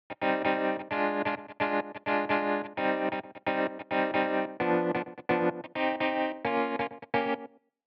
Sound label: Guitar